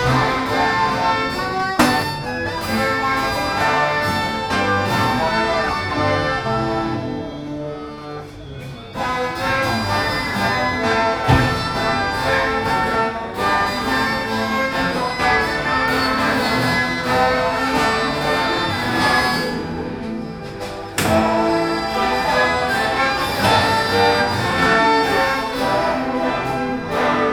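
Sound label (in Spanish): música, classical; congresso de viena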